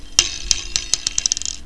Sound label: spring